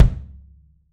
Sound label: KICK, Drums; 3D Sound; AXIS KICK